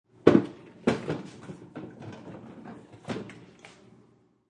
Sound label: Refrigerator Rustling, Searching for chow in a fridge; Kitchen; Household